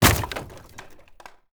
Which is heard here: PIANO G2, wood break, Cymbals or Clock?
wood break